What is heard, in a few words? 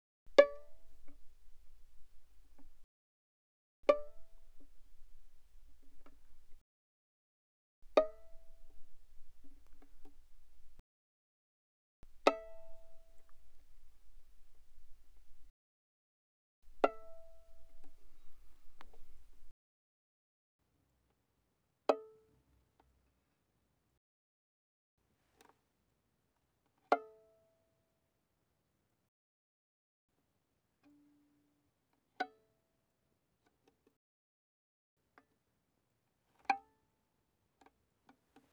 Violin pizz mf sul G Db5 Ab5; Strings; violin2012